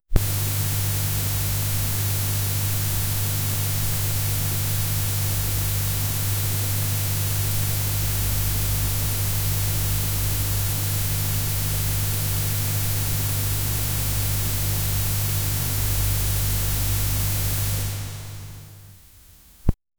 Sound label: old casette tape sounds